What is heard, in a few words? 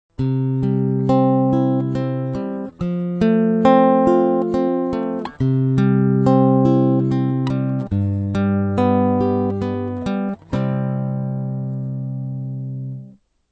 alpegio, guitar